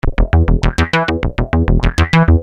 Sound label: Syn-Bass 100 E, Techno Synth, SynBs100bpm; Techno Synth Loops; Sonic Foundry (Sony Creative Software); Signals I